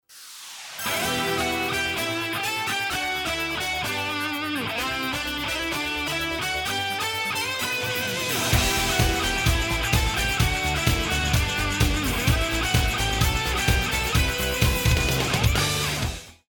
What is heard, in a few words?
start action; music kits custom